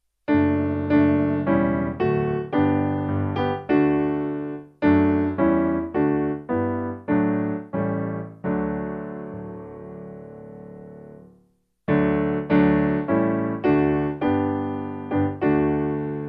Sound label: Petra Piano; Go To Dark